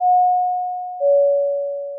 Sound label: ding-dong; alert